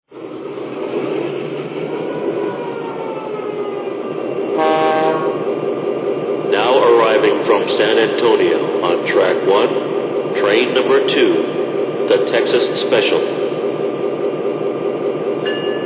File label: f3 aba